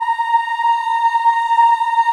A#4 Child Choir; USB Soundscan vol.28; Acoustic & Synth; AKAI

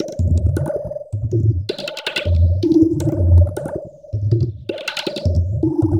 TABLAS; Zero-G; Chemical Beats

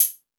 High Hat, E-MU Pro-Cussion

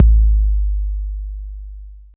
808 BD; TR808+909

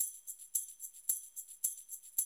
110, Classic Drum Loops

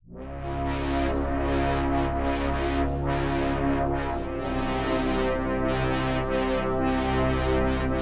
Synth loop (120BPM); musicradar

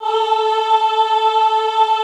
AHH A3, Classical Choir; Best Service; Extended Classical Choir

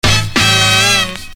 Scratch; Sonic Foundry (Sony Creative Software); Hip-Hop Street Beats Construction Kit; MAC MONEY

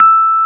E 0, Electric Pianos; Wizoo Electric Pianos

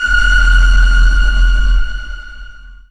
fx dron

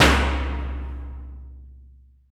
BANG, Impacts, Hits & Booms; Distored Reality

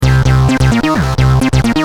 Driven, Bass; Industrial

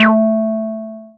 Synths BASS

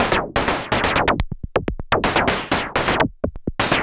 SH101 Clocked LFO Rhythm (125BPM)

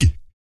GLOTTAL, vocal; ILIO; Vocal Planet VOL-2; Blues & Soul

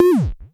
KLONE HI TOM, Klone Dual-Percussion-Synthesiser; KLONE PERC NW8

